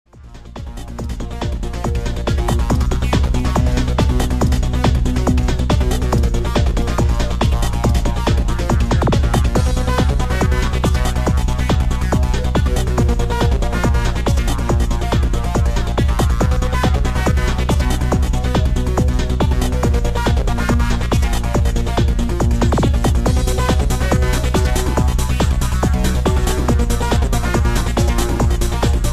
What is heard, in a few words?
Telephone Error